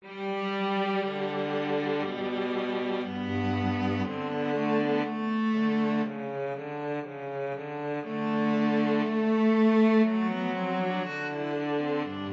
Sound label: viola cello duet